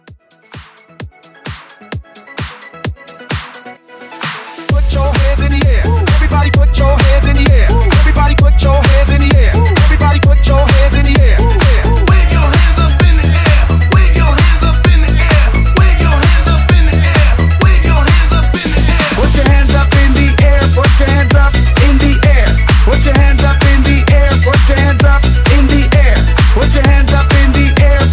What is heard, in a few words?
Club Hybrid Rework 130